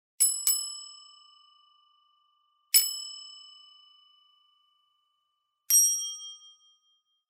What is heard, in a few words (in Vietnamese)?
chuong o quay le tan khach san